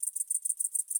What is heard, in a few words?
Grasshopper-Chirp